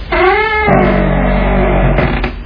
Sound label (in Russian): skrip odinochnii dalekii dolgii rezkii